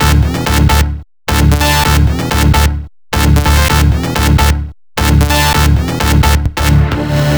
130BPM Melody; Vengeance Samples; Organity Kit

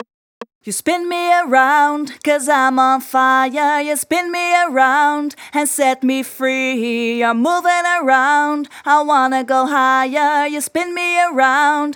Chorus, 145 BPM; Vengeance Samples; Spin Me Around

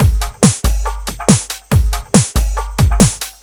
Loops BB 140, BreakBeats; Vengeance Samples

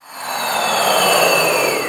reverse, FX; Vengeance Samples

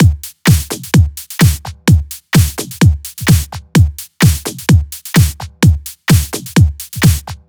128BPM The Big Groove Kit; Vengeance Samples

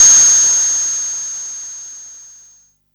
Crash, Cymbals; Vengeance Samples

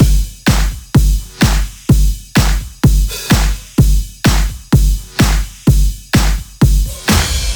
127BPM Kit; Vengeance Samples; Com Pop Kit

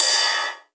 Ride, Cymbals; Vengeance Samples; VEH2

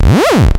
FX Scratching; Vengeance Samples

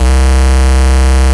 Basses Root C; Vengeance Samples

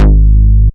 Bass Cuts; Vengeance Samples; VES1 Instruments

